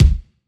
kick; osu

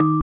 BELL

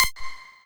lead

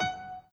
Piano Chord

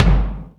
Loud Bass

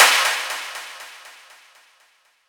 boom

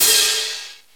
cymbal

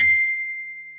Bell C7

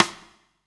Clap

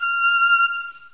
pipe

